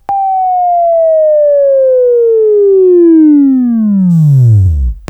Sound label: Buzz; Labview Program; Sweeping Training program